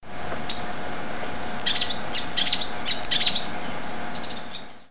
Cissops leverianus; PN Itatiaia; Silveira

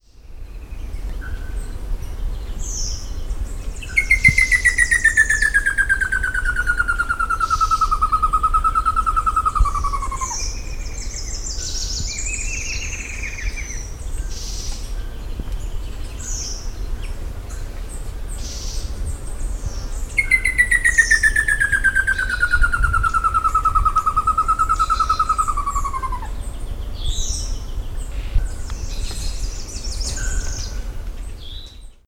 Merulaxis ater; Jaguareg; Bertioga; SP; Silveira

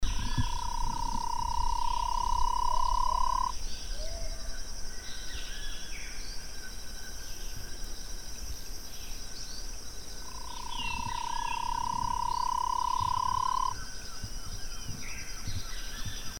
Scytalopus indigoticus, SP

